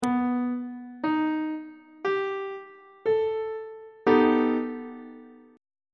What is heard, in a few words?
c flat augmented 7th chord; on treble clef